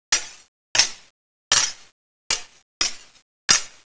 sword fight

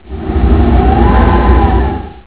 tornado sound

